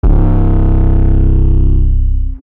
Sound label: 808s; AUDIODE; EPSYLON; epsylon drum kit